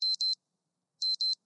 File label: Beep; Xiaomi; MIUI-12.5; alarms